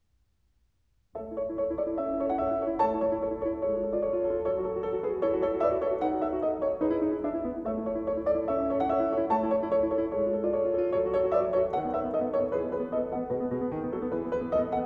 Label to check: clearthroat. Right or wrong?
Wrong